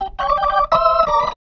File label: B3 LICK G, PLAYED